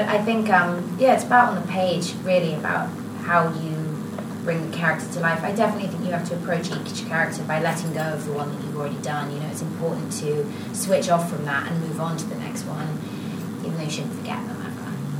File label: Horn